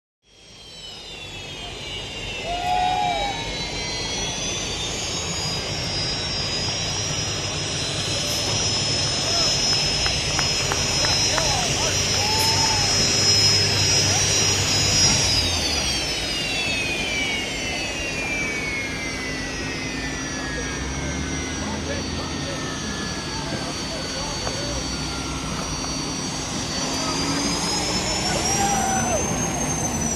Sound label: Hydroplane Dock Amb